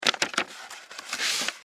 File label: Envelope Manila Open Pull Paper Out; Office; Offices